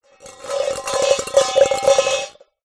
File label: foley metal bowl shake movement plastic object inside rattle move